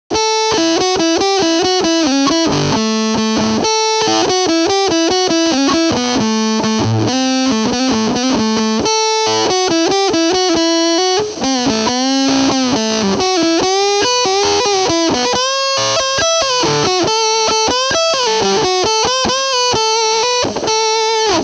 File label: lead